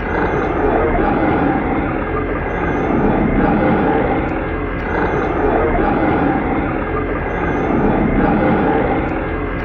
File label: breathalizer; Sonic Foundry (Sony Creative Software); Ugly Remnants VOL-2; UR 2 FX (Long)